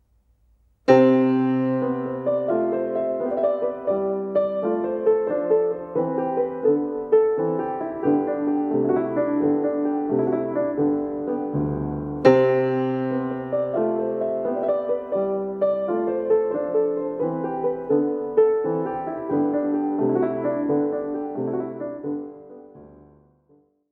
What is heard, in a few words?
Gangar